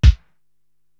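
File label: BD, bass drum, drum machine; Korg TR-Rack; Sluggo 1a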